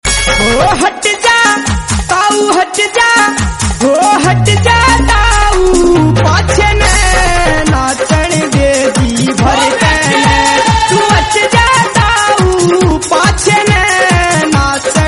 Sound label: Haryanvi